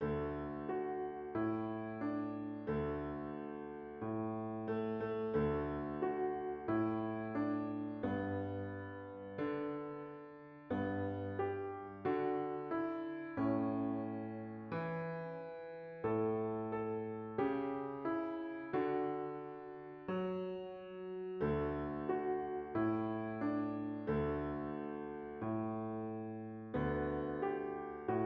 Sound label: jingle bells piano accompaniment at 90